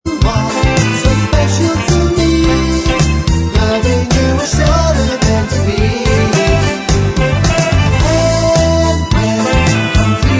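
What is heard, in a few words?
backing vocals Track, backing tracks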